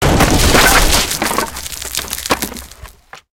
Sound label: wood crash